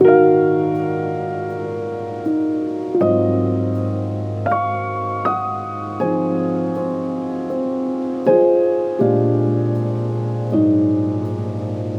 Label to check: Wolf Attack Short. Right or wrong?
Wrong